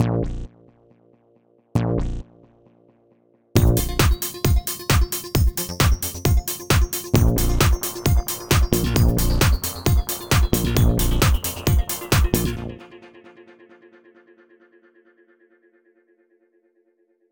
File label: Signature Sound; FXHome; Signature Tracks